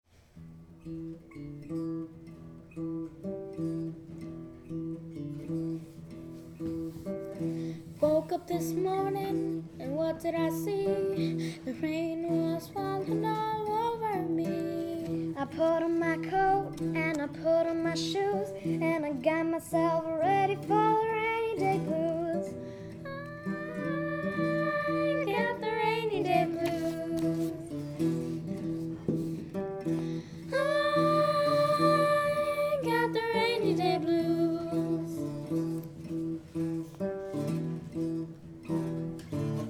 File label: rainy day blues